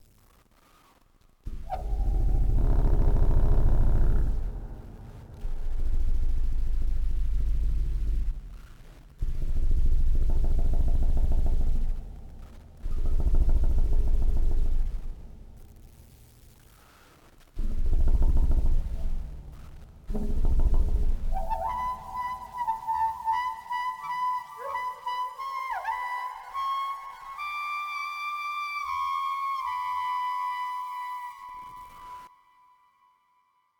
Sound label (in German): Reed, Hall; 7te Rohr HfK